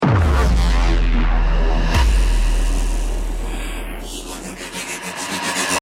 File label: deathcam; music